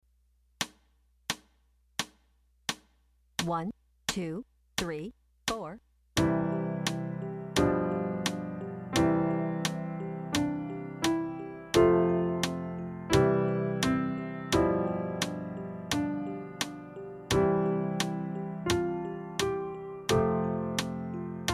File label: Melodious Piece